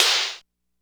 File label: DR660 DANCE, DRUMS PERC; BOSS; Rubber Chicken Software Sample Bank